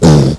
Grunt; SimPiglet

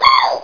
dead drop

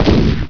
Dino Boom; PowerPete Demo; Jurassic

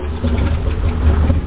hall schub

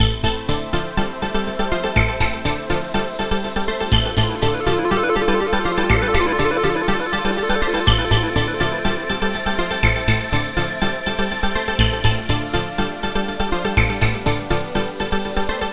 techno loop

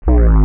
Trying to Capture; Bedlam

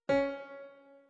echo; MOBICLIC 91; TOUTEDIT

